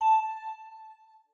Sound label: w2k - notify (A)